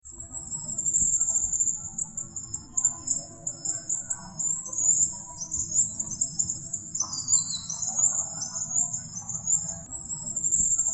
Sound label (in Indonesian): suara sepah tulin